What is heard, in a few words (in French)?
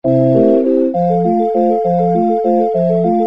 basse arpege